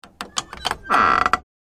Vampire coffin lid close

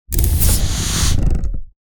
Gritty texture with air release, steampunk; gfxsounds

